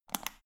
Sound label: power button turn on off quick